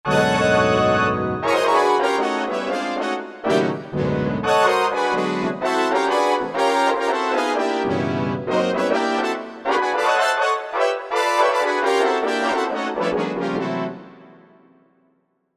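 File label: Chord 7+, Korg MSC-1S; Cord 6; Korg MSC-1S Variety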